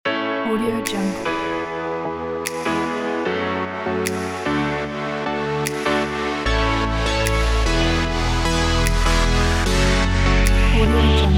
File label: the future bass